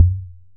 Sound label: impact Soft heavy; Kenney's Sound Pack; Impact Sounds